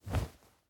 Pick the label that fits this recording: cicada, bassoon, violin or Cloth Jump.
Cloth Jump